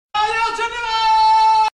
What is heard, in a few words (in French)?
alerte generale